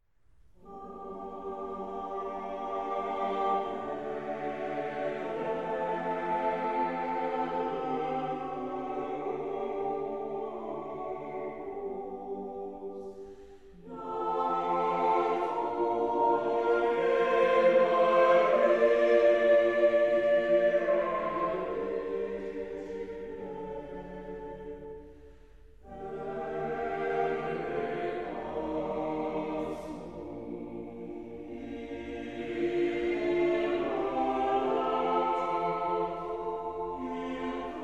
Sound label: Church Choir; Byrd Ave Verum